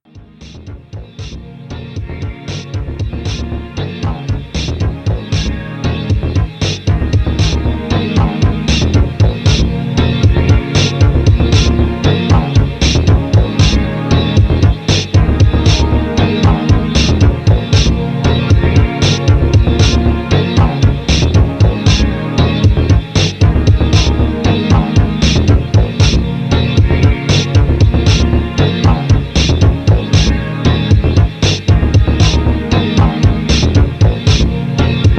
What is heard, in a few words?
Rabbit Snare; Raw Sounds District